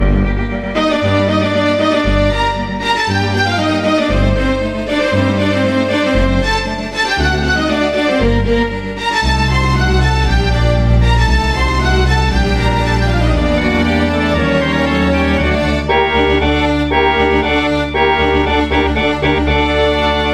ringtone english ringtones

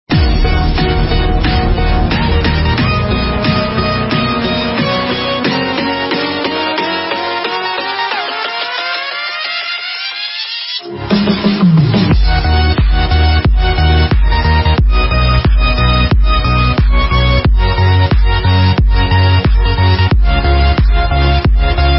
instrumental

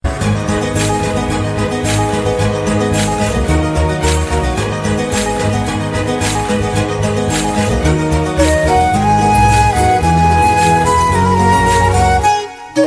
romantic violin ringtone

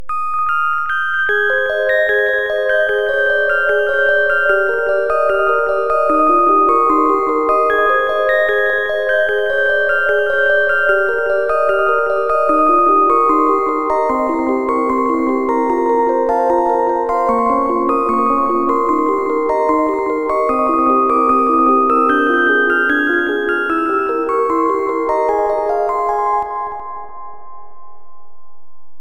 lovely music ringtone

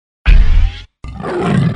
roar ringtone

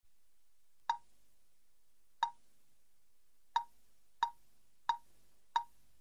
click msg tone

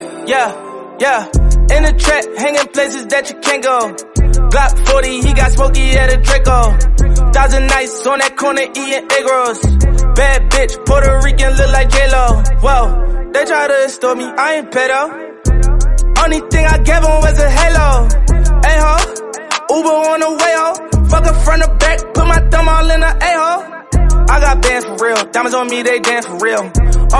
hip hop english song tone ringtone

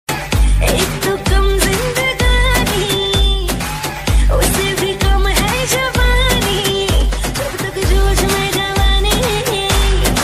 bollywood ringtone

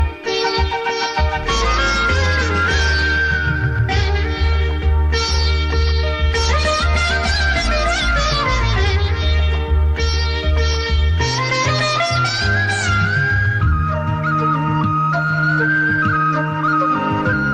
malayalam song ringtone

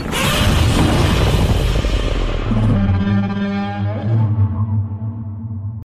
elephant roaring sound call alert tone

